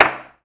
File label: KLP, Claps